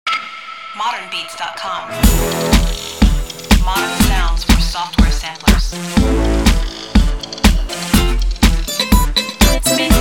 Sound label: Urban Guitar Chopz